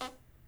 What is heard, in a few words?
woman fart; rutti e scoregge